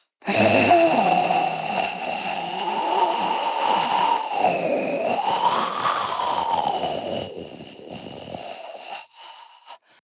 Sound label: zombie female breath throat; kreaturen